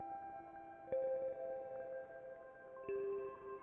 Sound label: eurorack